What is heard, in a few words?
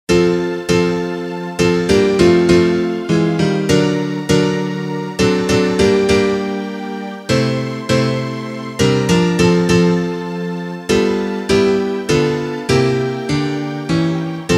instrumental